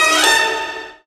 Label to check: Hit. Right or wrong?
Wrong